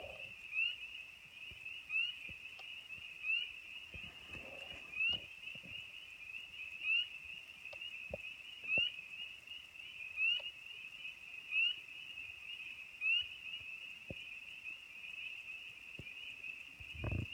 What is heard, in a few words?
lullaby; charlevoix